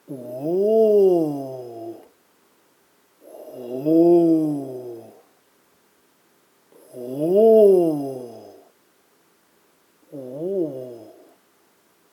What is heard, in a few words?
Aaahs